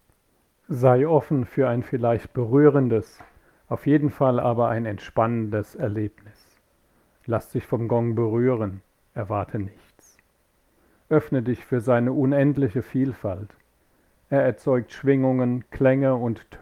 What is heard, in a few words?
gong